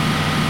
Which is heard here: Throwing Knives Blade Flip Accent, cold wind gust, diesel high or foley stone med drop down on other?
diesel high